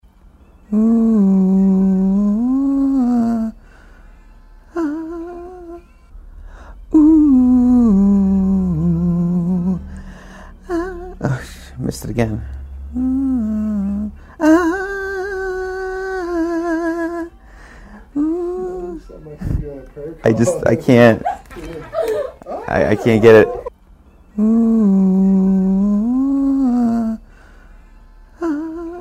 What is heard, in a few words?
Singing